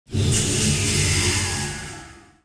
use magic

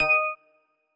button

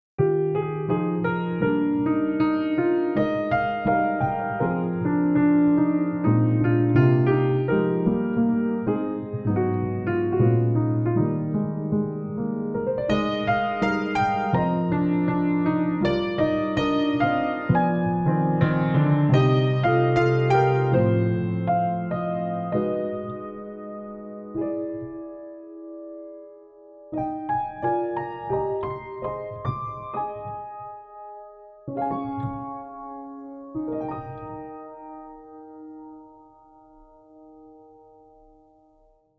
Piano Eb

